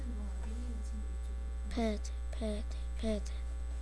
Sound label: pad